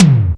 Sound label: DX Mid Tom